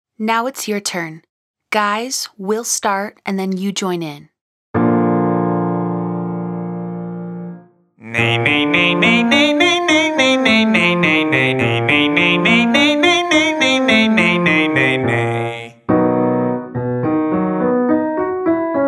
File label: Nay Nay Nay Double Long Scale Male